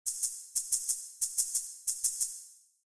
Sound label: shaker shekere cashishi maracas